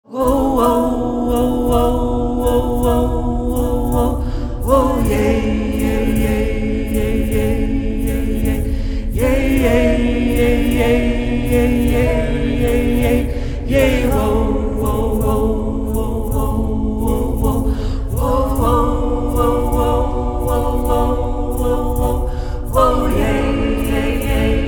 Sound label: Wolf Chant